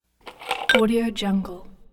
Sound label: ice cubes